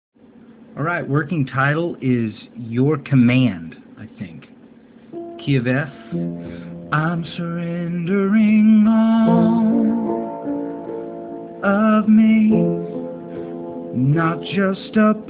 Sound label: Chorus, rough recordings